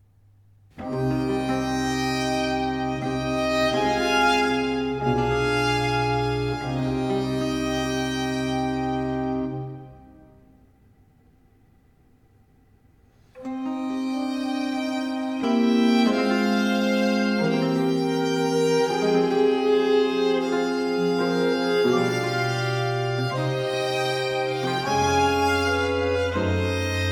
Sonate 5 in D minor - 1. Grave; Arcangelo Corelli; Corelli - Complete Works; Klassika

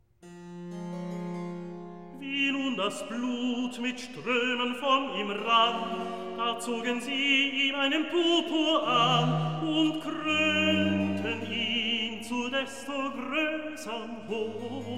Recitativo; evangelist Wie nun das Blut; Klassika; Handel Masterworks

